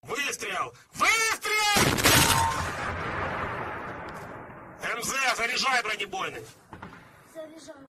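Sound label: shot shot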